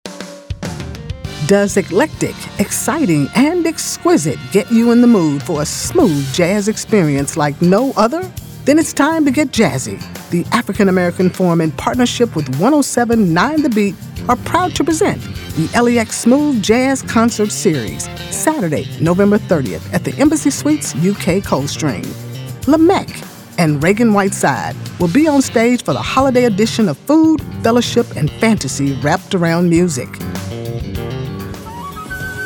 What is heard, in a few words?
Smooth Jazz